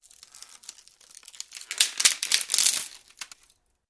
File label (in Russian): audio effekt lomayet sya suk dereva; prirodnyye zvuki zimy zimniye audioeffekty